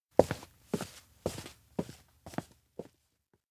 steps indoor exit shop; meguriai; fumiya; anemoi パッケージ版 1.1

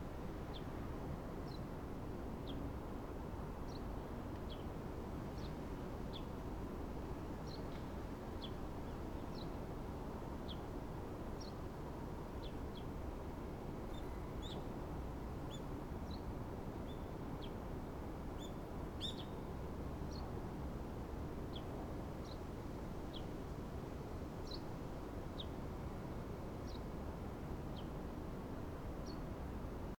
outdoor residential

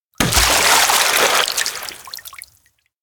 enter water; anemoi

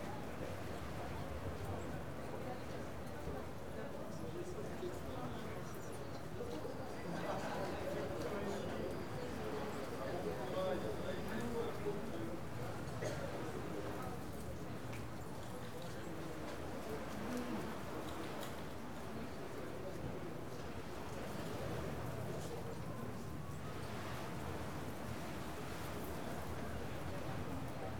outdoor loghouse front; anemoi

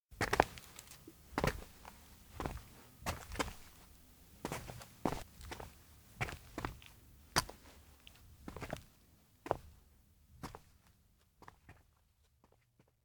steps outdoor town trudge; anemoi

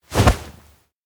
back pat leave; anemoi

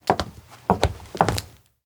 footsteps female guest; anemoi

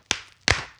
bone break; anemoi

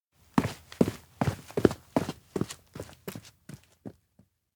steps indoor leave flee; meguriai; chiharu; anemoi パッケージ版 1.1

